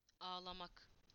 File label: cry